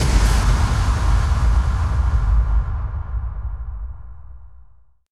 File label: impact